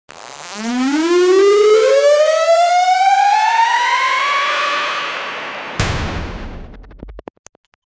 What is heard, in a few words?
a jumpscare; fnaf style